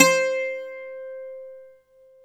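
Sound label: BZ F C, bouzouki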